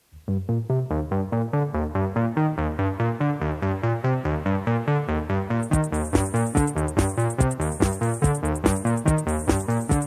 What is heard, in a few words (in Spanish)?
CORTINILLA; PUBLICIDAD; Sintonias; DIARIO POP RNE